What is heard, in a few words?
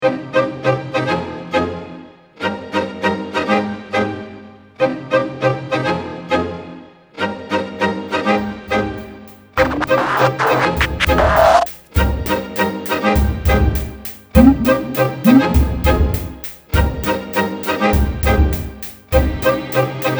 hiphoppish